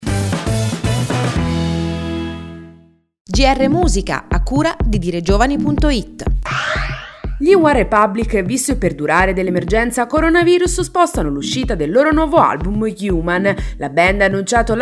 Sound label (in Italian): musica